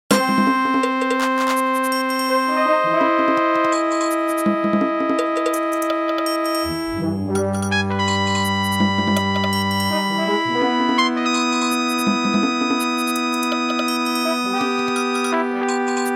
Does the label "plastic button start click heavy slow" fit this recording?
No